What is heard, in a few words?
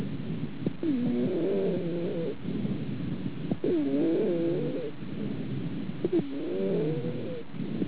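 asthma, pulmonary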